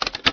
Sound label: unlock; openspace3d; FPSDemo